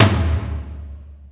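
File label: XLo Cannon HL, Alesis D4 drum machine tom; 300 Drum Machines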